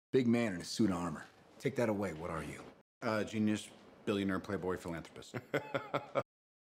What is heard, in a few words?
RingTone